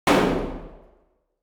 fire dist int; weapons; m16; arccw ud

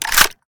chback, weapons, m16; Urban-Coalition; Decay; arccw ud

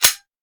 mech, weapons; CopsNRobbers

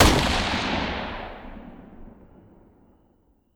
fire dist, m16 weapons

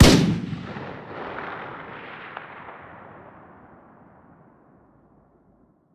fire dist, m16 weapons

